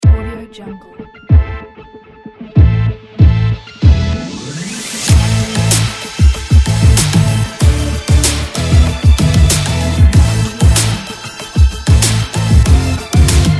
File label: the hip hop